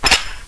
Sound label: old cocking; svencoop